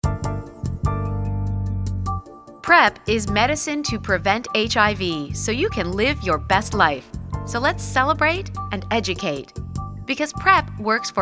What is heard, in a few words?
on hold jazz